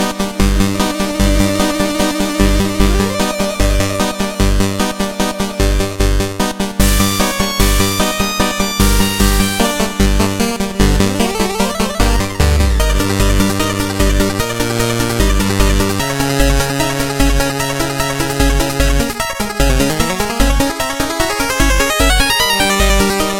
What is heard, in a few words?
Music Village; Shovel Knight King of Cards